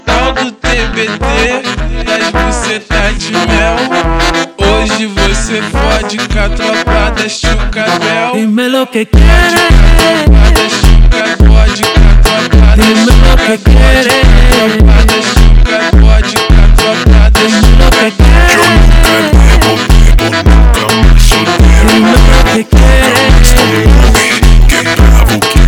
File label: Funk Slowed